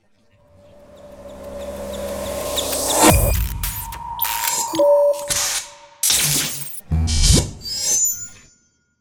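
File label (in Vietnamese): nhạc nền hiển thị logo video hiện đại nhịp nhàng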